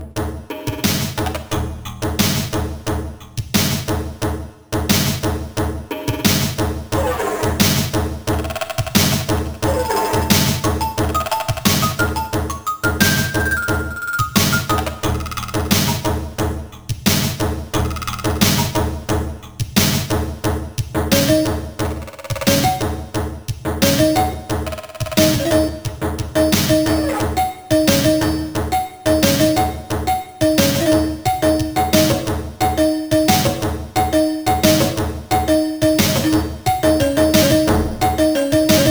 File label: Background music (map and introduction); the quest for the time bird; amiga gamerip